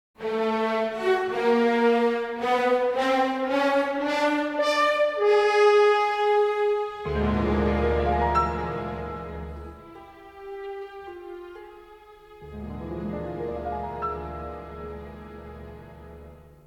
Rhapsody For Piano And Orchestra; The Paradine Case

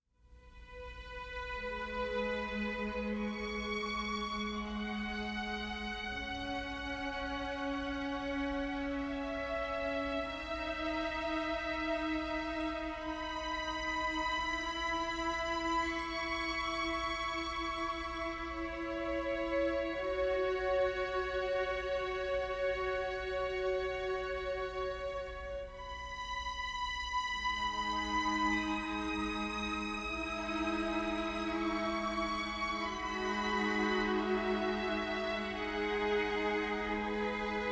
Ballet Fantasy For Strings And Harp

